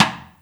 Snare stick, Hip Hop; Akai MPC500

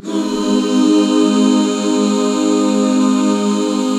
AB MAJ; Best Service Gigapack 1

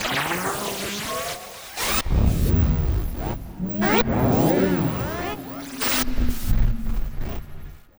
Synth SFX; Trespor FX; Sonic Foundry (Sony Creative Software); The Electronic Music Manuscript Collection

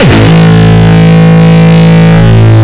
Longkick, kicks

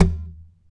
TAP, FLAMENCO Guitars

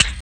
RSS, 160 BPM

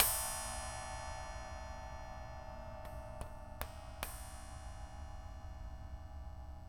Bell, World Percussion; Sony CS

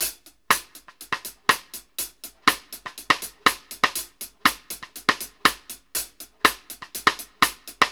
X-STICK; Sampleheads; New York City Drumworks VOL-1; KD JBEAT 120

